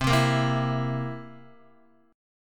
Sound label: C 7th C down Guitar 4F, 4A, 5C, 5G, 5C, 6E; strummed; JGuitar